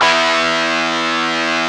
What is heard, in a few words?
GTR FUZZ, Metal; Power Chds; Club-50 - Foundations